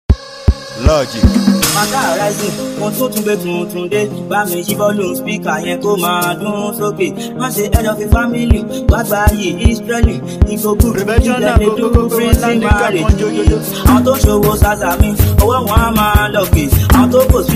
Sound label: Professional Beat